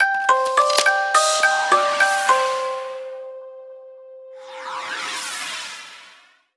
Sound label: Alert Sound Cheer